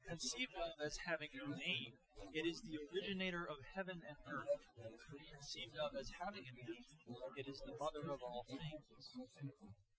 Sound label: male inst src